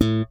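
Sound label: Yamaha thump, Bass; Masterbits CLIMAX 7; PATITUCCI BS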